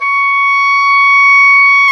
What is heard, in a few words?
oboe, Roland Orchestral Winds